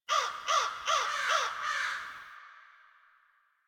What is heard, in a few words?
Bird Crows; Warframe; Ambience; Nineteen Ninety Nine